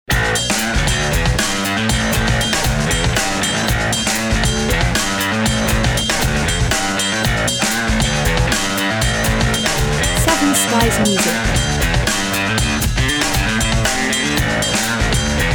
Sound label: Apron Bass